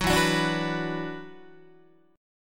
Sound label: E 7th Suspended 2nd, Guitar; strummed; chord; JGuitar